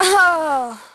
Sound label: death; Pulse Racer